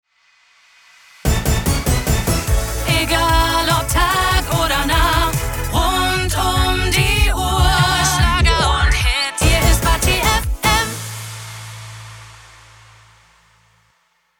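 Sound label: Sung Jingle, 147bpm A Major, Slogan; Batti FM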